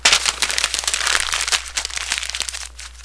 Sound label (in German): papier knuellen